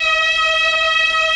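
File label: Mellotron strings E4; Greytsounds VOL-1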